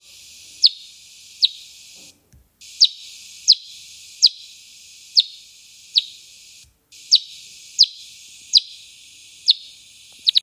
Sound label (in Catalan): rater d'aigua, sons ratpenats; ratpenats plafons